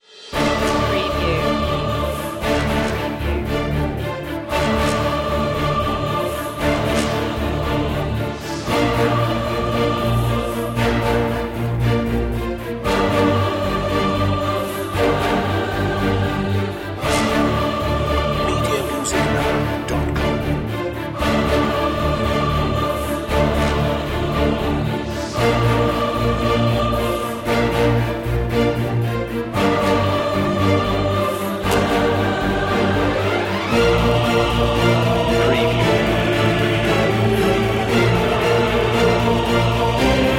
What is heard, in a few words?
no perc high intensity